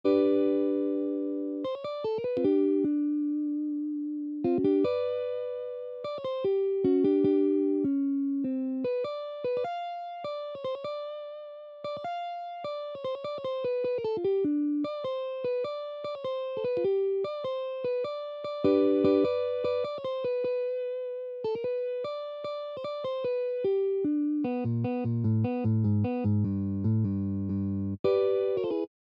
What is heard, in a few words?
Slide Guitar Solo in Open G